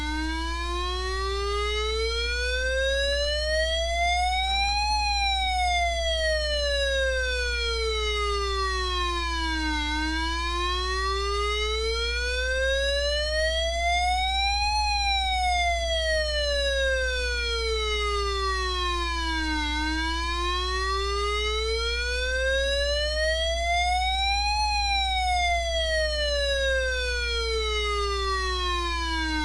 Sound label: ATTACK, Civil Protection Tones